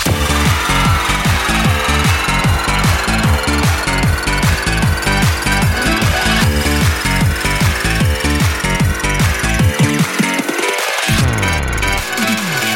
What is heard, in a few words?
Super Mega Win